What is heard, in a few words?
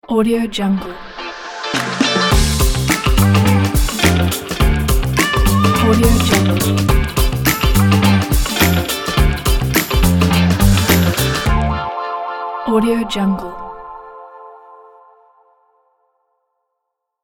dynamic youtube vlog intro